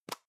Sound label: plastic can lid screw open soft; objekte; dose